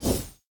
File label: close combat heat sweep; CrossCode; battle